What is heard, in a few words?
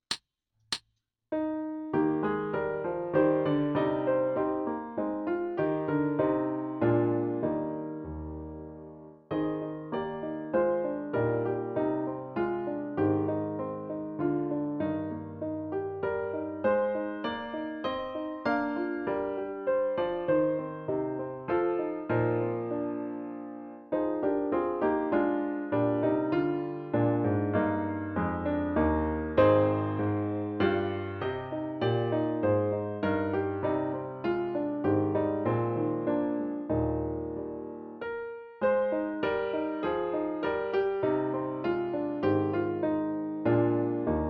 Full Tempo Accomp Only; Solo Accompaniments; Trumpet; Fitzgerald; English Suite No 4